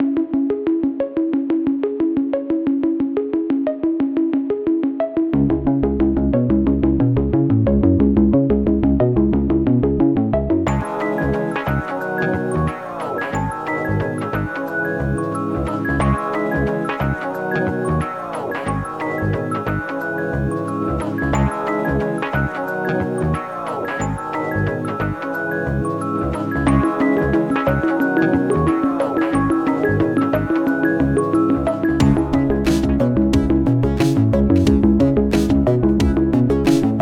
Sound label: synths are good